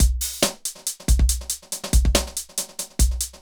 Synth Loop BPM140

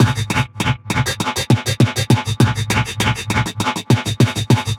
perc 100 grain groove, Percussive Loops, 100 bpm; Sample Magic - Transmission-X

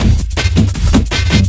Drum & Bass, 160 BPM; Ueberschall; Drum & Bass Flash Trackz

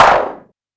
technox clap; Quasimidi Technox

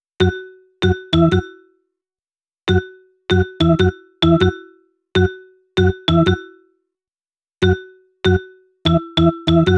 Organ Vibes Combo, Cinematic Funk; Sonic Foundry (Sony Creative Software); Cinematic Funk Urban Tone Poems

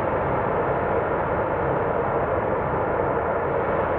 sparse soundscape samples; ReLooped

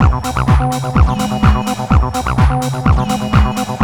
ACID JAM; Best Service Advanced Media Trax Building Blocks

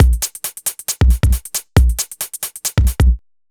Beat, 136bpm, uk garage

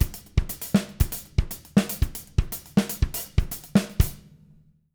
120 CARIBE; Sampleheads; Peter Erskine Living Drums

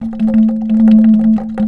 A2 ANKLUNG, JAVA ANKLUNG; AKAI S6000 CD-ROM; WorldSounds

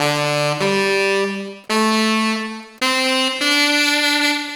Sax Funky; Drum N Bass; Misc; Sonic Foundry (Sony Creative Software); Knutrix Drum & Bass

